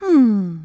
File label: hrmm; pauline; SM64 CO-OP